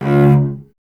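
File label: strings cello C#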